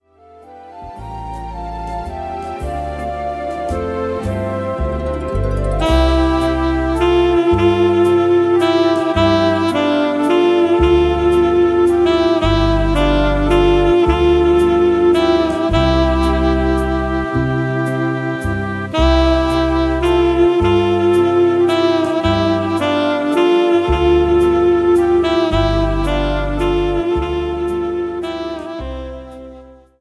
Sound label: BARCAROLE